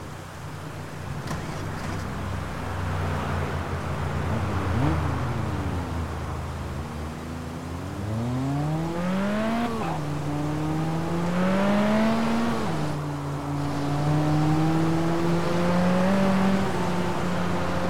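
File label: aston martin db9 volante, car; TDU-1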